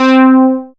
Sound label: synth, c, Top End Tinklers